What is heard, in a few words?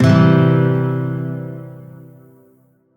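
Shawzin Major Chord; Music; Warframe; Lotus Shawzin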